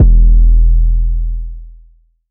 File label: 808 E; Cymatics; Oracle Sample Pack; 808s